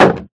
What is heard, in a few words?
block, shield; Minecraft